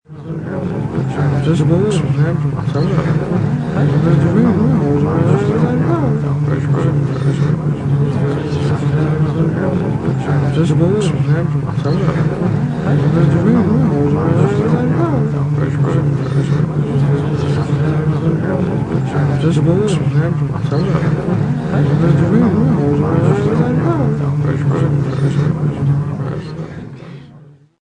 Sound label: Crowd Mumbling Long, Small to medium crowd mumbling, waiting; Small to medium crowd mumbling, waiting. Long version; Crowds; Backgrounds; Lynne Music